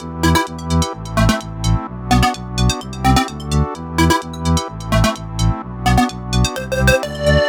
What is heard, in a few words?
Melody 128 BPM Root E; Vengeance Samples; Melody Kits